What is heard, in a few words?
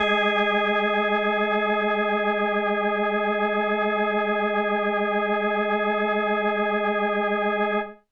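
Indie Organ Kawaii G#1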